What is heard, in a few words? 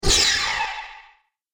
Ion Blaster Single Shot, laser gun